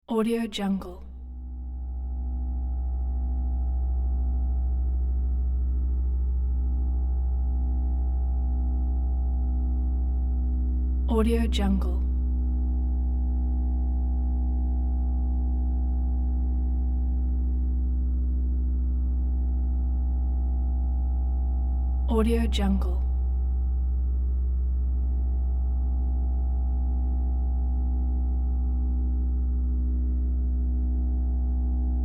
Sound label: sci fi interior atmosphere; futuristic sounds; آمبیانس فضای داخلی